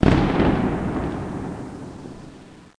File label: Firework Explosion; Learn to Fly 3